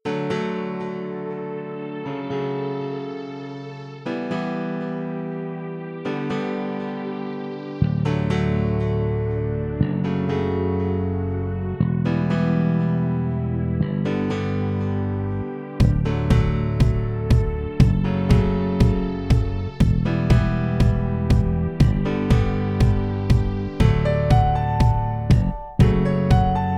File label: uplift me; mixkit; premiere elements